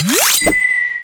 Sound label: Boss Attack